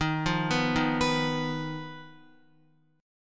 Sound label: Eb Suspended 2nd Sharp 5th, Guitar; Ebsus2#5 arpeggiated; JGuitar